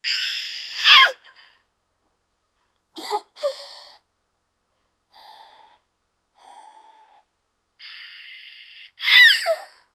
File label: raspy chain smoker, cough